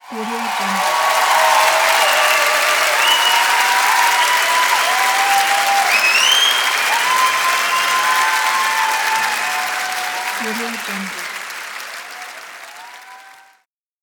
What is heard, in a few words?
cheering applause